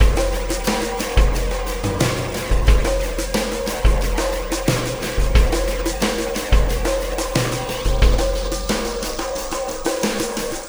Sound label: Ripple Perc